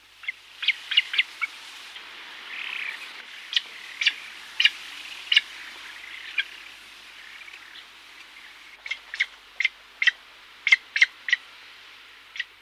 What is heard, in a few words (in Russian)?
kolpica